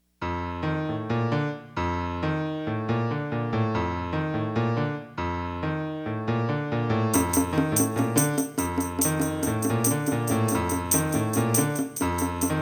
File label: Rumba fade